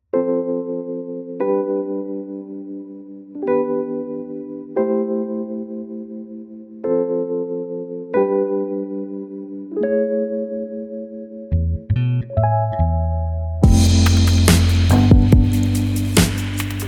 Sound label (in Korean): Rain, 감성 POP